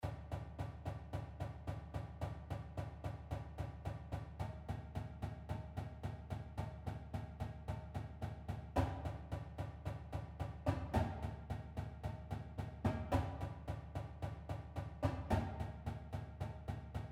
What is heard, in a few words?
Accents y Taps